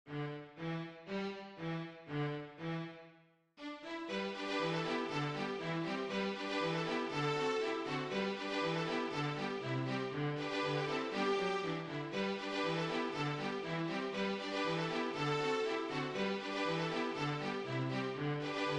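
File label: violapalooza, ensemble music for viola and other instruments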